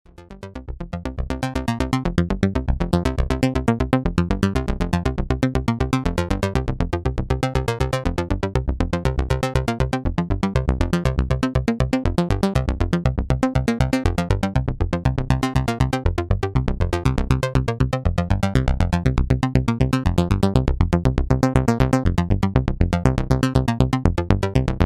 Roland JP08 SAW SEQ Dual Mode

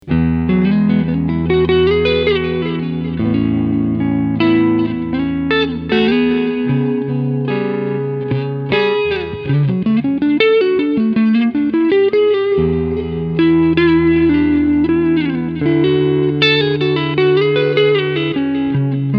Bassman Emulation

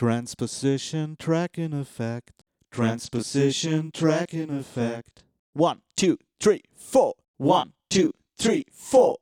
EH V256 Transposition Tracking Effect

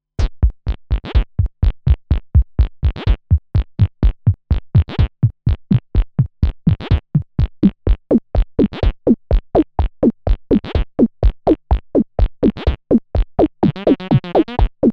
Analogue Solutions Megacity Split Mode

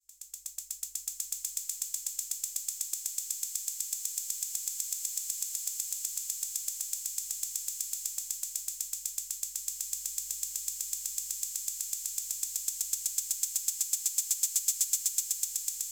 Elektron Analog Rytm HH Basic Decay Time

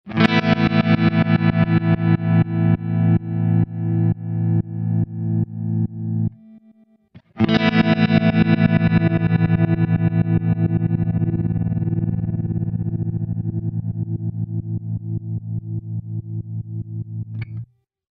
Eventide Ultratap Delay

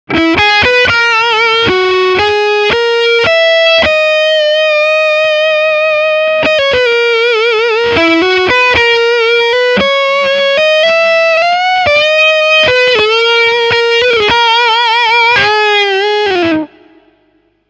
Egnater

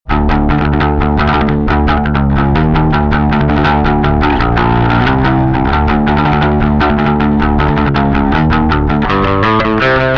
Sansamp neutral Char right

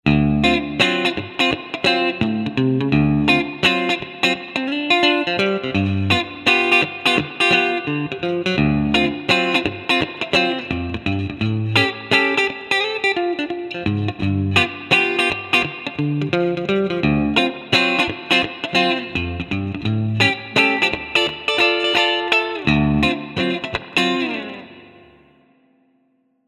Strat Middle clean

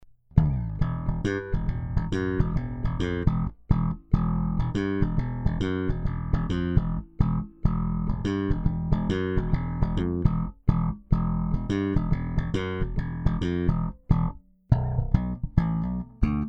Yamaha TBRX Passive 3 PUs

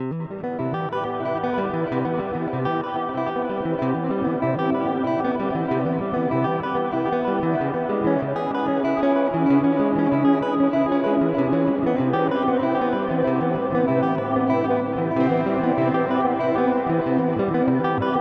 Delay Reverb Modulation; UAFX

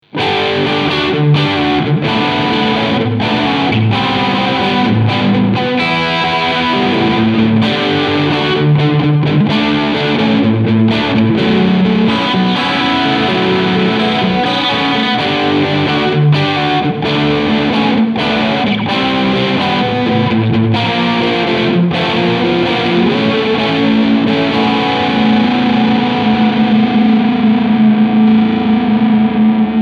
GFI System Skylar Spatium FULL Mod Overdrive

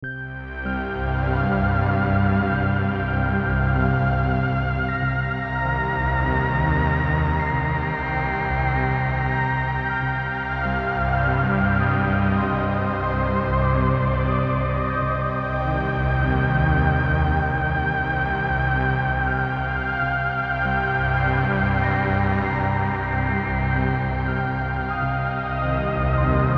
Dark Ghost

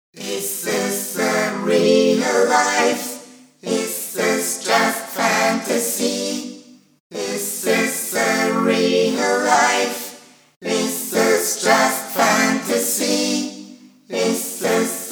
Eventide H3000 3d and 5th Voice

